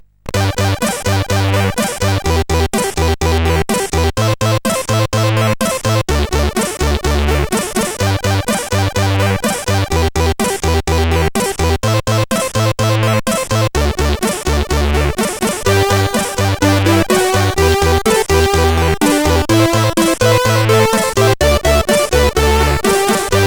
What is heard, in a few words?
Circular Letter tune, CSG8580R5